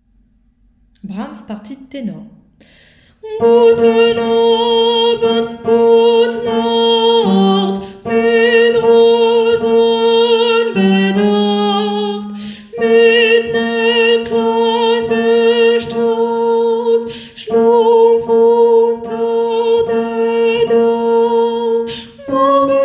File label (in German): Wiegenlied partie tenor